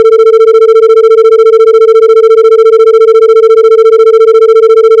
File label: Autogenerated sine 120 cents